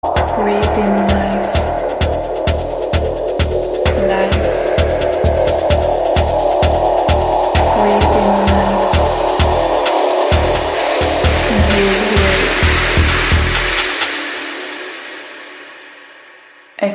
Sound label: trance ringtone; tomorrowland